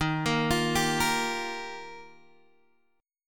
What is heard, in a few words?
Eb Major Add 9th, Guitar Open G